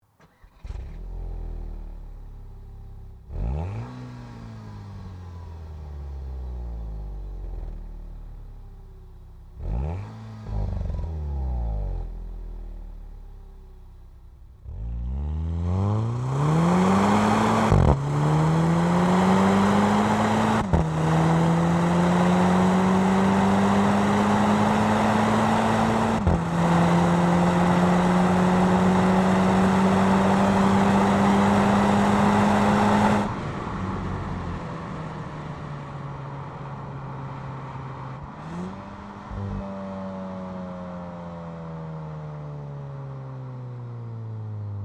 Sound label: Volkswagen GTI DSG